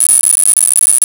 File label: ppm 10k 250bps 256bits